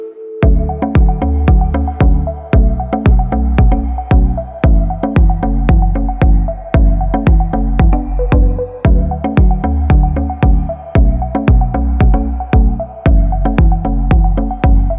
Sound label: Ringtone